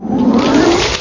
Buildup

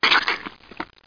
SQUISH; rottdemo

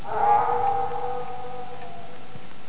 howl; horror

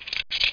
KEYOPEN; lands

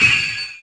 impact; Far Gate; ENGLISH

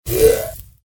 Syn Appear; the incredibles save the day

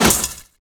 arrow dirt

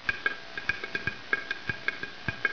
flys; CHASM

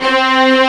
Tremolo Strings; cubic

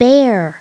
bear

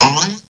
Boink

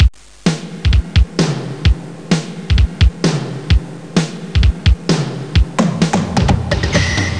Super Beat, DRUMMACH; Leonardo The Inventor; Broderbund; Riverdeep

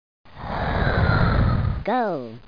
bonus fall; PacMan Adventures 3D

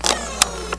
servo

